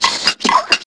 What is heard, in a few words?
machines